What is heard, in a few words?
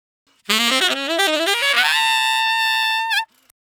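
145 NuJazz Sax A major; Digidesign; Pro Tools; Pro Tools Essential Loops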